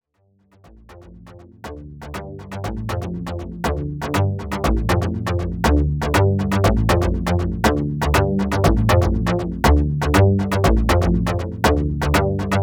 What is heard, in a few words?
DX7 V Funk Bass